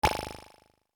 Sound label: jump; 【効果音】レトロゲームのジャンプ音; レトロゲームにありがちなコミカルなジャンプ音です。攻撃を受けたダメージ音としても使えます。